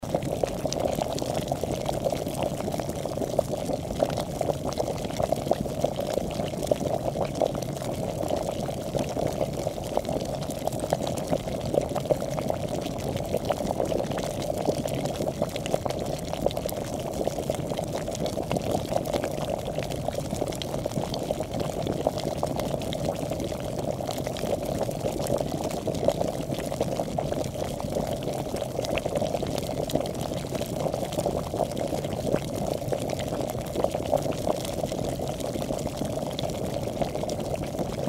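kipenie vody v chainike, water boiling in a kettle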